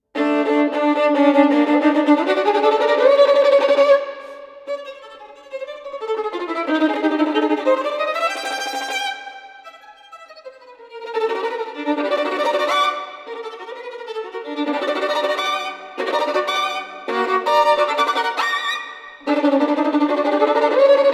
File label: Wild, erratic